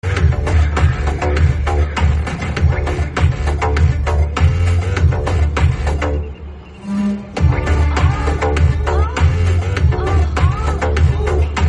moan noise sound

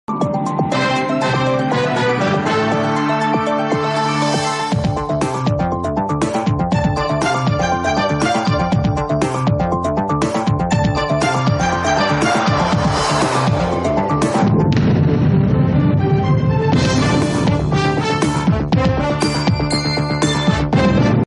Etv news telugu music

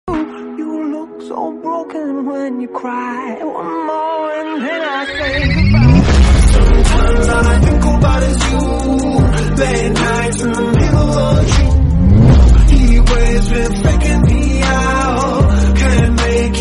Slowed and reverb

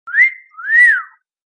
Wolf whistle sound effect

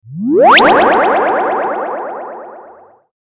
Wifi android notification sound

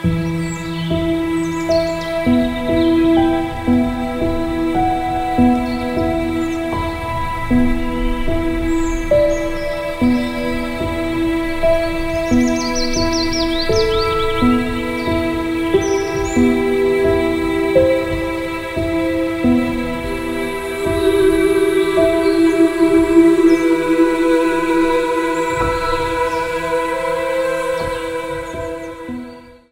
nature music; Good morning america

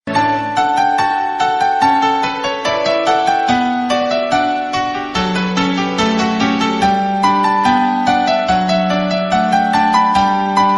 Canon in d major

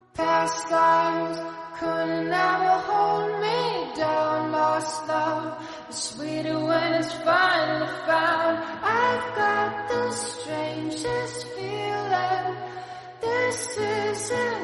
english Song

